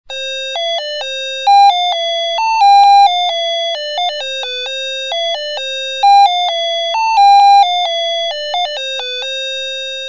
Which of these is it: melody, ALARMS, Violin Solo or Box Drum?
melody